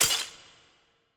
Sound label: Card Finish Break